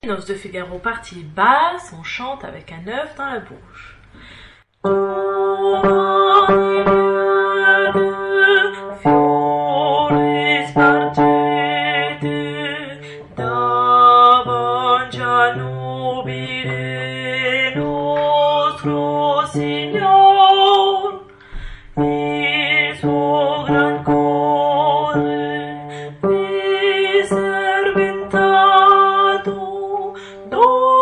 Basse; Giovani Liete; Mozart

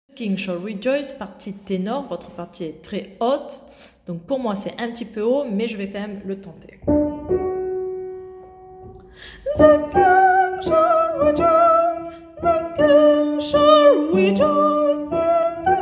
partie tenor

